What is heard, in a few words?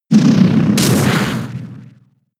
Punch; Shadow Punch; attack moves; Pokemon; gen 6; XY; ORAS